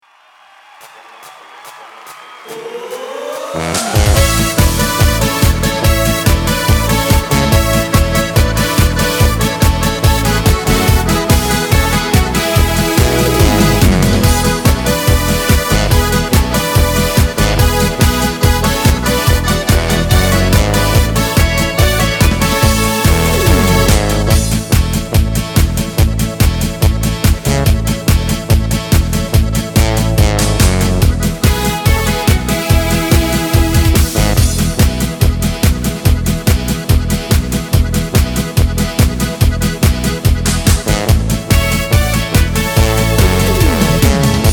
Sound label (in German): Karaoke mit Chor